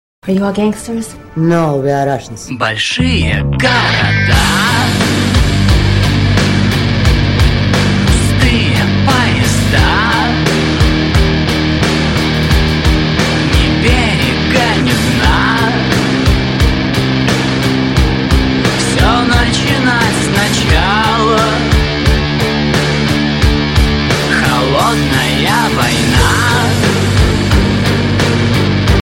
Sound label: russian gangster